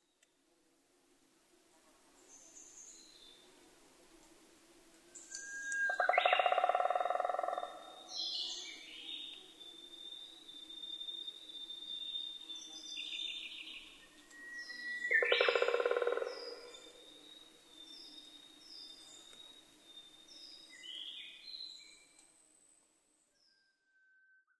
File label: drumming